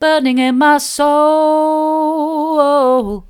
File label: burning in my soul mid, vocal